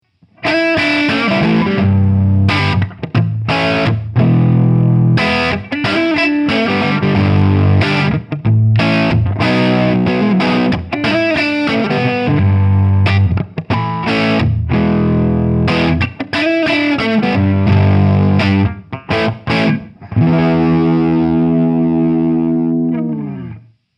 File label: Gibson LP 50s Neck Pickup Crunch